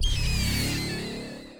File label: MOVE; C64 Dreams; Multi-Sound Default